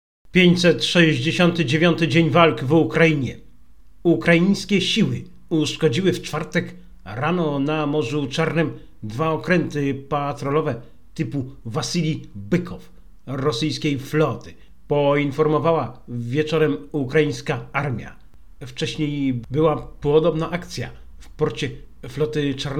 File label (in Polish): Hymn